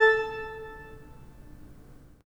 pipe organ, 3-D Audio

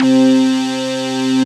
C Synth, One Shots

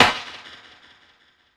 SD, Drum & Bass, 160 BPM

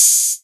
808 Hat O

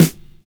DISCO SD, DISCOKIT; USB Soundscan vol.11 - Drums Dance & Groove; AKAI

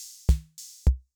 disco, Yamaha MR-10

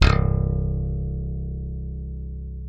C1, slap thumb; Xsample VOL-1

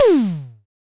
FX SYN, Analog PER; Sound & Vision - Gigapack II

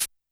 Shaker Analog, YAMAHA RM1X

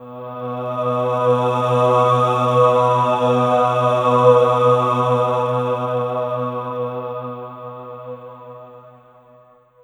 PAD, Drum & Bass, 160 BPM

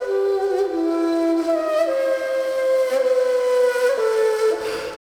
SIERRA FLU; Best Service Advanced Media Trax Building Blocks

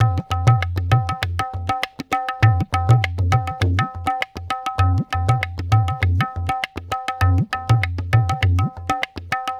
Tabla

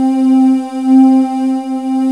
VOI SYN, Voices; Sound & Vision - Gigapack I CD 2

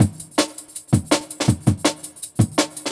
Jungle Breaks

